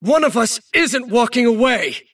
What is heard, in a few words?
attack pain; davion; dota